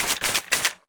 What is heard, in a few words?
Shake